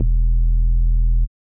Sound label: Not Nice 808's